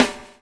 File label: BUBBLE; Spectrasonics - Backbeat